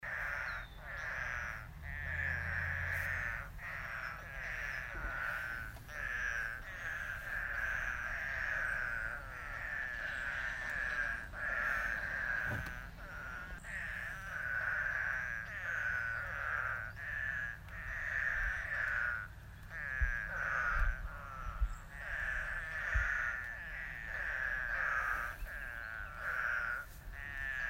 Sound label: eastern spadefoot; Mark Schilling